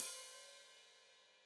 Hat Foot Open, Hi-Hat

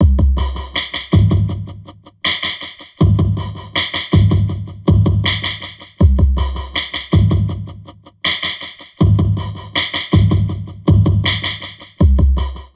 Drum Loops Crazy Processed

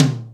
Akai XR10 Mid Tom; Drums

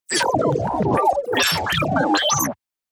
Glitch Insect

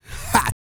GRUNT, MALE HUMAN; ILIO; Vocal Planet

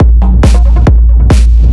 drum, 138, drum-loops, Techno; Techno ID; fatboom

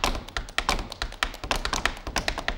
STEP + CLAPS, MSTEPS+CLAPS; Best Service; World Colours

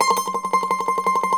MANDOLIN, Tremol Mandolin; StTremolMandolin

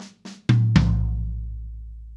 Ballad Fill, Drum, 70's Ballad; Sonic Foundry (Sony Creative Software); Drum Tools; Laidback

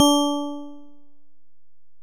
Bell, Alesis DM-PRO

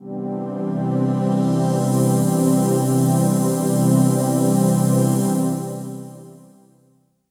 Ambient Pad, Drum & Bass Melodic Synth

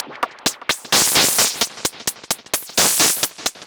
Modular 130BPM

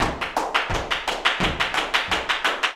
flamenco tapping, jaleo; Best Service ProSamples vol.46 - Flamenco

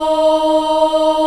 D#3 Child Choir; USB Soundscan vol.28; Acoustic & Synth; AKAI

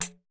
perc, Roland MC-909

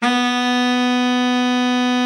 BARITON, BARITON SAX

